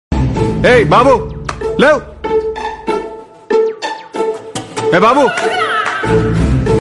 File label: telugu song